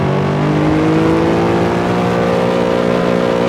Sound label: third, vehicles cars; jag xfr; lwcars